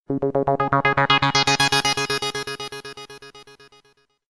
cool synths

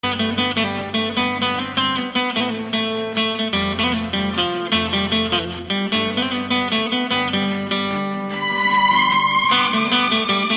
aashqi guitar

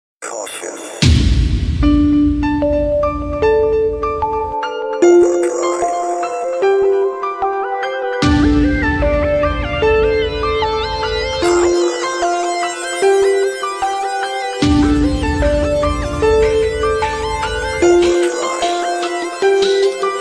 techno

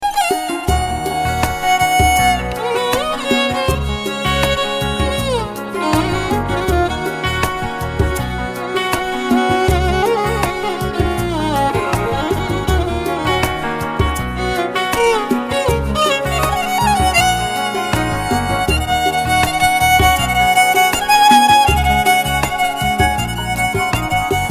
flute mix

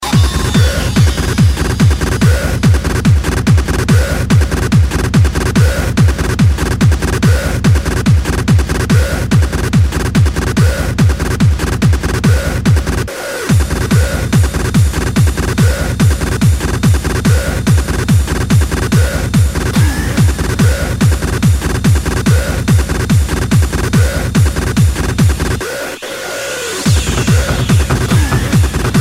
hardstyle remix

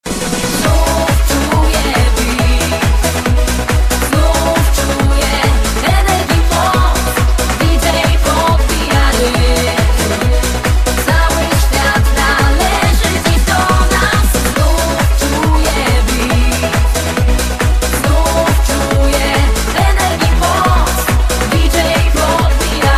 beat, ringtone

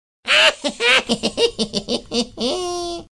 redneck laugh

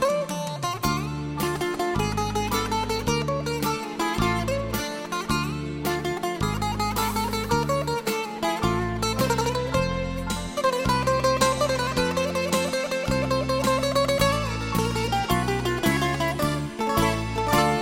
traditional greek music

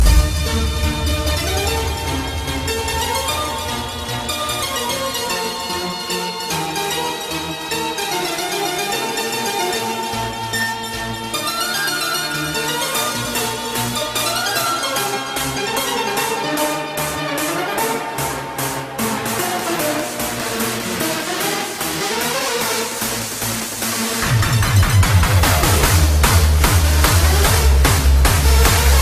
hardstyle remix; Legend of Zelda

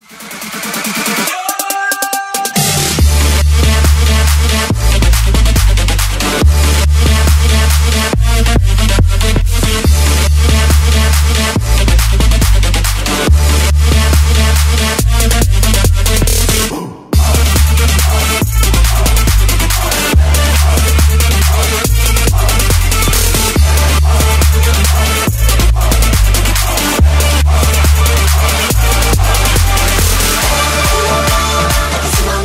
trap

